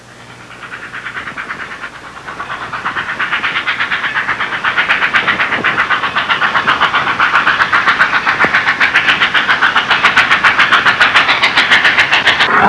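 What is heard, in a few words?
fast train; trasporti; treni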